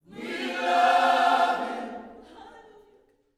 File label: WE LUV HIM, Vocal Planet Gospel; Spectrasonics; GOSP PHR